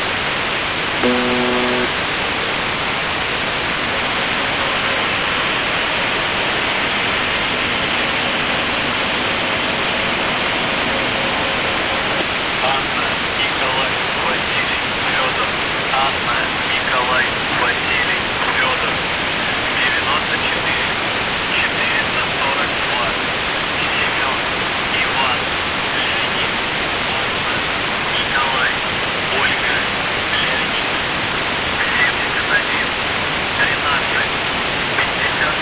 buzzer usb msg

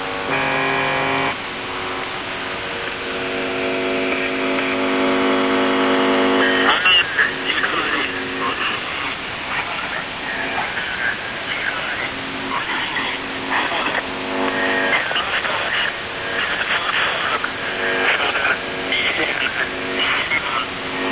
buzzer usb msg

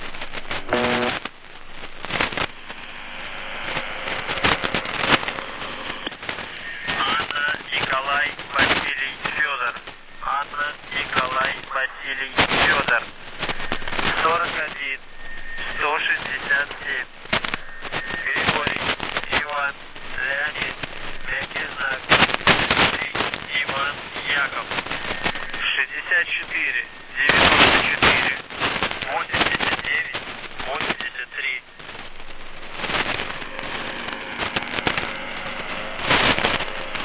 buzzer usb msg

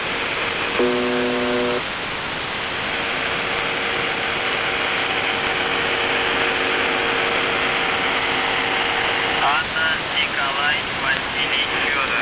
buzzer usb msg

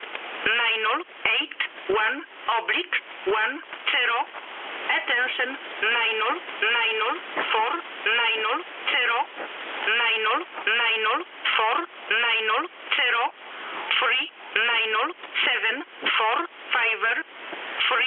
usb msg

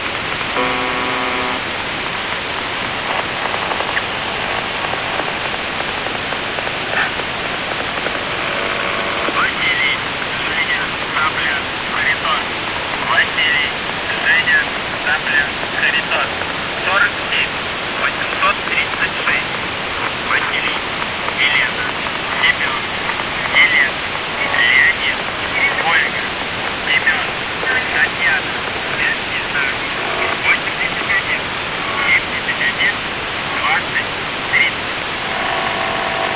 buzzer usb msg

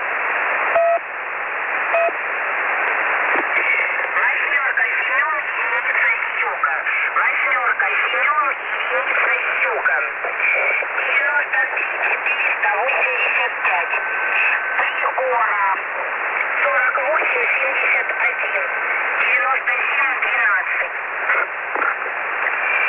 usb msg

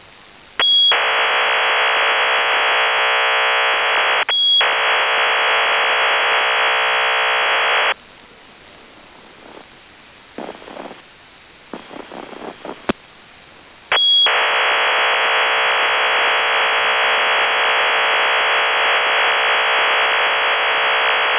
ofdm45

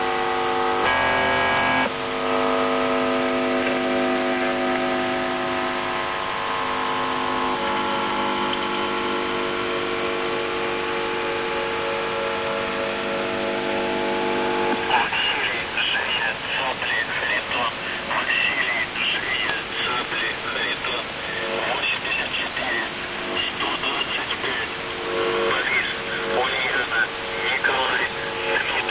buzzer usb msg

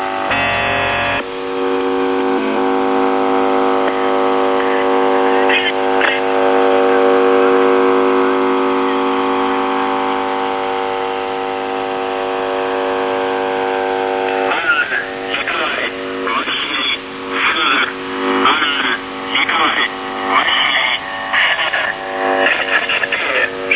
buzzer usb msg

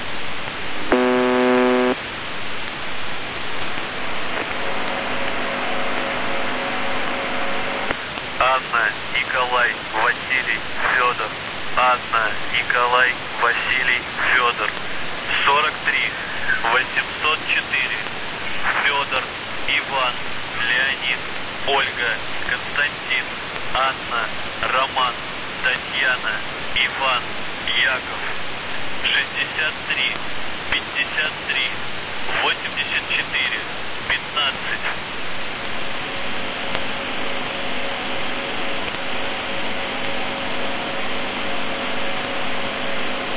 buzzer usb msg

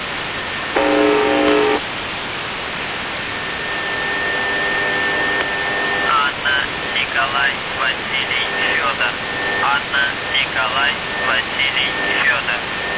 buzzer usb msg

